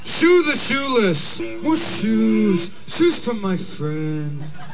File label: shoes